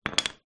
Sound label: household door wedge wooden down on tiled floor